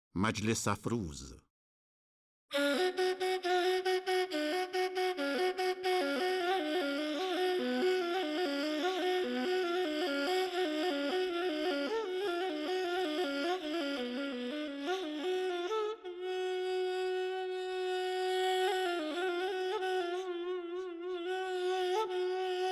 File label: Majles Afruz Homayun Ney; Dastgah Homayun